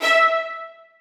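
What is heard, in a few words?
1st violins staccato E5; Sonatina Symphonic Orchestra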